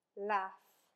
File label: laugh